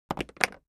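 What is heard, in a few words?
Mech Metal Object Move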